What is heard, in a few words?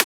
Transient; FLPRO